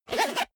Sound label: zipper small case close fast